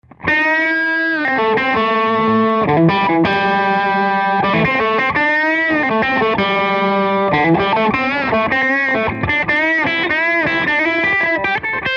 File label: Guitar solo; anderson amp samples